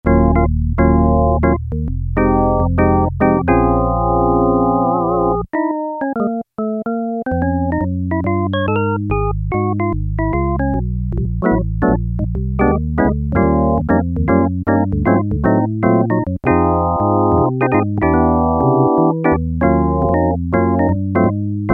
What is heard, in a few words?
E. organ, Yamaha DX7